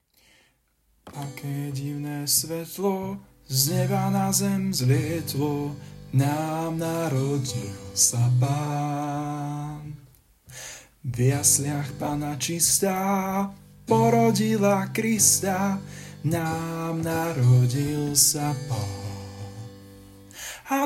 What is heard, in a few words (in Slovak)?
bas; Z betlema sa ozyva